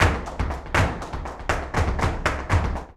Flamenco, Tapping, Bulerias, 121; ProSamples vol.46 - Flamenco

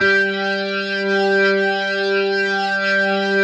G4, OCT DIST ORG; Sonic Reality; Vintage Timetraveler

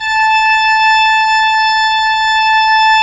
ORG P. ORG; Roland - LA Composer vol. 1

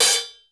METL CY, METALIC DRM; 90 sSampleCDs; Crypton - DrumBase5000

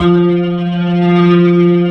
F, The Piano; InVision Interactive

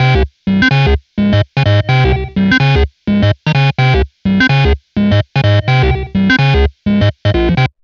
127 sound 1 L, Fresh Disco House; USB Soundscan vol.20; AKAI

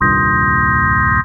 synth organ, dx7

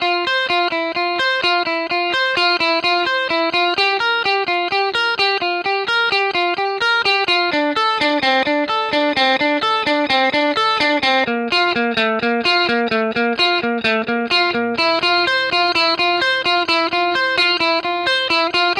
lead F Bpm128, 70s Guitars; Fox Samples; Back to the 70s Guitars

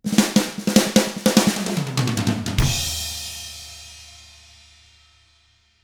SHUFFLE, Drums

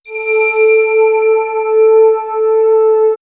Swirls Bell Fad, pads; X-Static Goldmine

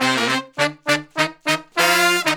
Horn Section

